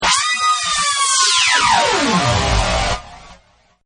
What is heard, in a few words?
Evil Synth, Button; Buttons; Sound Effects:Multimedia; Partners In Rhyme